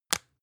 spice jar close bright; industrie wirtschaft; gastronomie

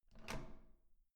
door handle up

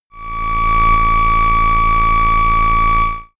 high ringing low rumble; digital

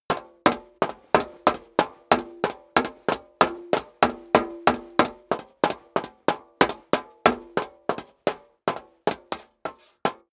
step high heels metal dry run sequence; schritte; Footsteps

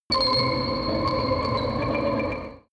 extrinsic birds, energie

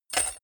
metal chain hit wood

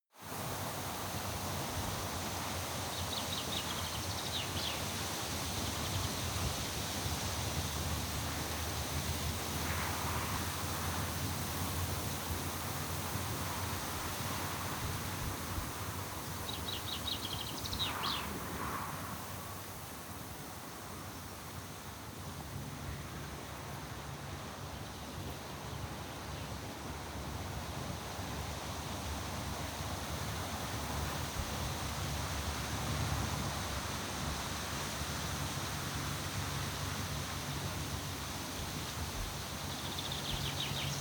wind insects plane

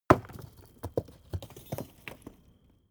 stone large fall metal; natur-tiere; steine

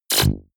sci-fi shock squeeze

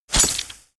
arrow hit flesh; krieg-waffen; pfeil-bogen; soundpool

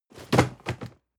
cardboard box medium fall high hard; objekte

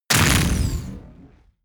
sci-fi crushing burst; schusswaffen

